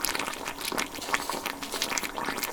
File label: boiling; Golden Crayon Sounds